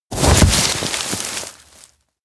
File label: rescue; fumiya; anemoi